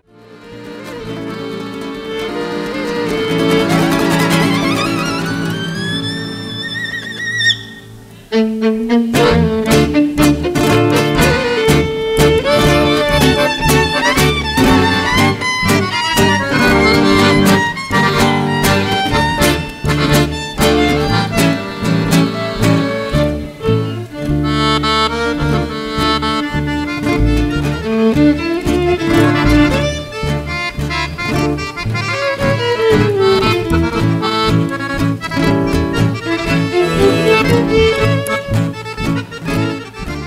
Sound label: Mazurkas Tango